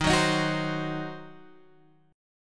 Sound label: Eb Minor 6th, Guitar Open G